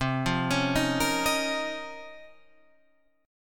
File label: Db Major 13th C